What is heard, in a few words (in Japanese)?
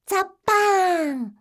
zappaann